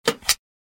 button sound

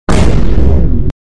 vine boom sound distorted

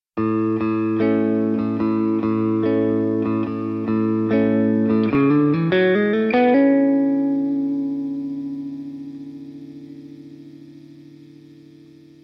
beautiful riff

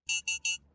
lockon alert; gta

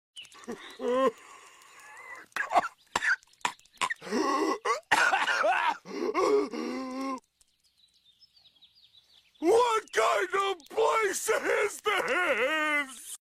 coughing

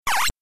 cry; starfy

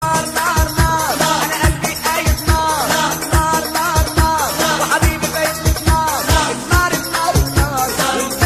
arabic egyptian song; mezdeke

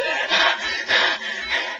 respiration

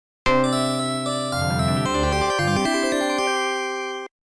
samsung startup

